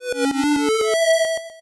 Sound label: 8bit se